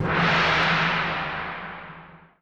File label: Print Complete